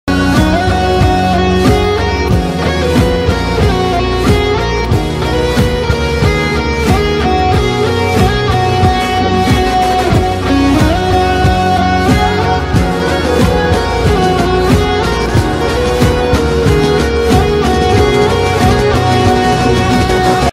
instrumental ringtone

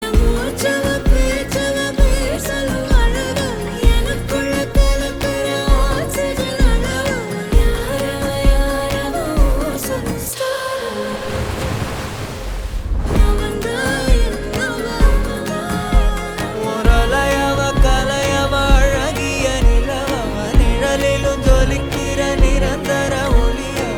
song ringtone, tamil love

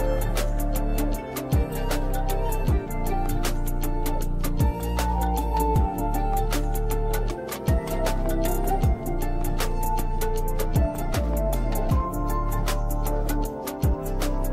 flute ringtone

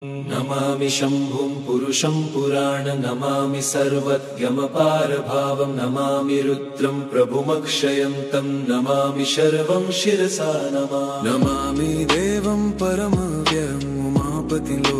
shambhu stuti ringtone; mahadev

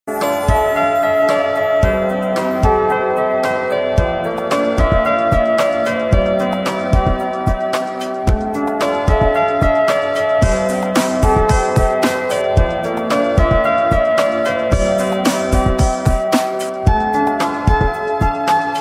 ringtone instrumental

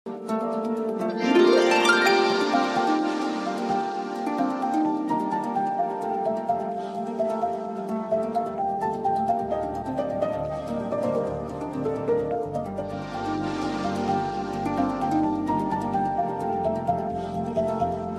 instrumental ringtone

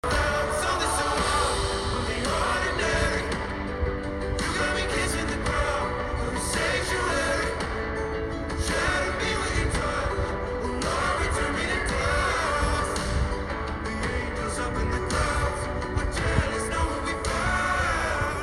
song ringtone

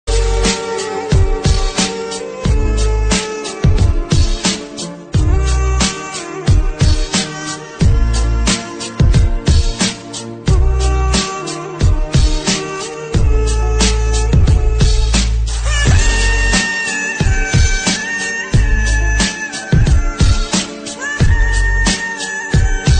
joker sad bgm ringtone

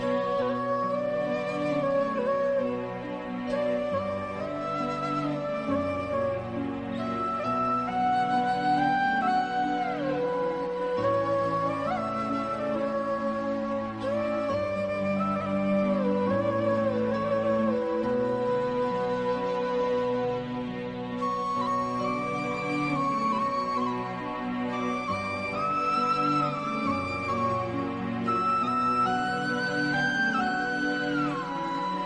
lord krishna flute ringtone; mahabharat

